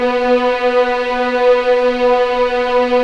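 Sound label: B3 legato piano, violins; East West; The Ultimate String Collection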